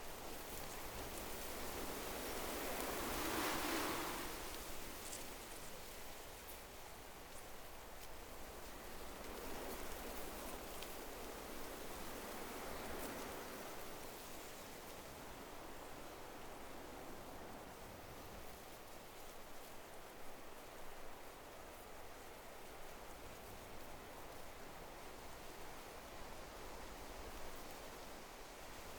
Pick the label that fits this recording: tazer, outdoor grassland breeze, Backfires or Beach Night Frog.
outdoor grassland breeze